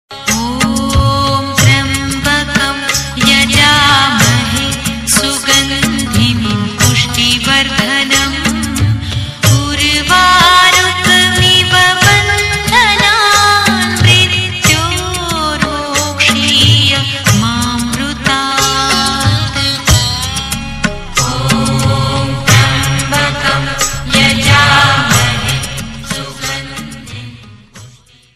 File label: Mahamrityunjay Mantra Ringtone; ringtones